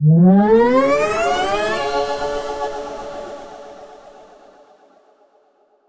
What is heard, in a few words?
playful chibi jump sound; pow